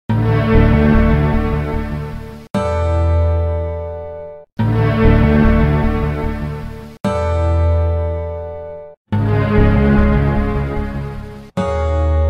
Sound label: apple mac symphony